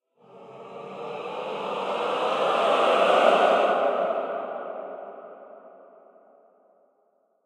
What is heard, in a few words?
Choir; Aleo; that dragon cancer